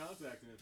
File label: Piano; keys